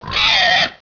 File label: raptor roar angry